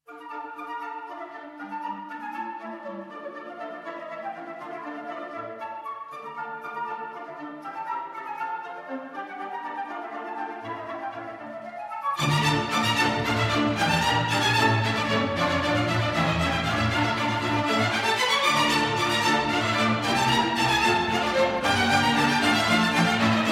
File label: Scherzo in D Minor